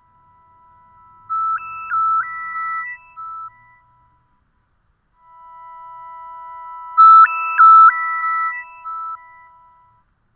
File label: Ascending